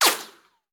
Bullet Ricochet; Sound Ideas; Guns

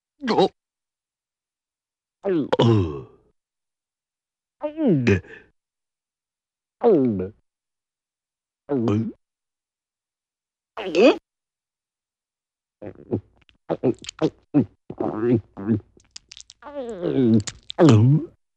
Funny Gulps, Cartoon; Hollywood Edge; Cartoon Trax

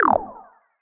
UI Back; They'll Come from the Moon

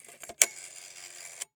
Telephone Rotary Dial; Communications; 1001 Sound Effects

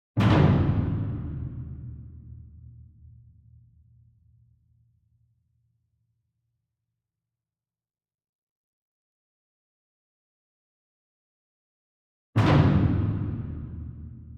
ENSEMBLE DRUM Bass Drum Concert Flam Far; boom library; Cinematic Strikes Construction Kit

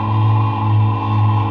Machine Generator Loop

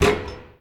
Street Ball Glass Rim; SFX Kit; Street Basketball

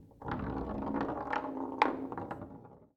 Foosball Ball Roll

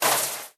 bite; Apotheorasis

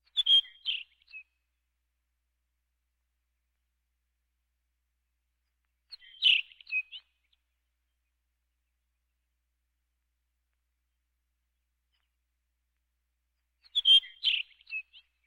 Birds

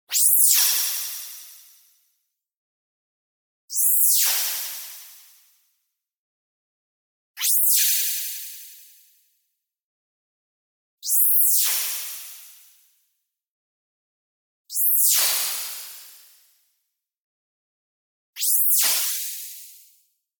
ZAP Light Magic Sweep; boom library; Anime Essentials; DSGNMisc

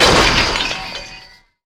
Chain Break; SFX Kit; Creaks, Scrapes, & Misc

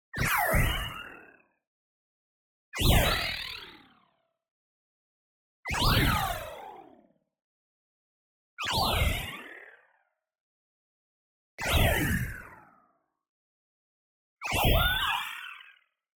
tonal, friendly welcome; boom library; Anime Essentials

